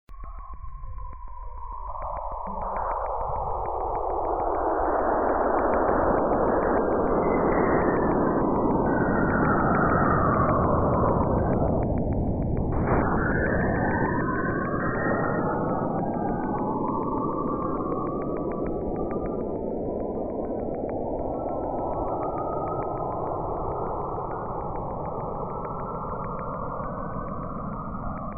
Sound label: sounds of saturn